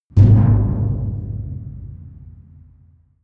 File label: metal tank impact long